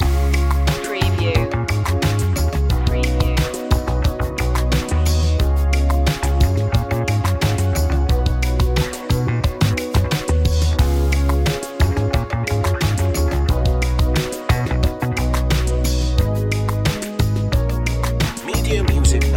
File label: night ride loop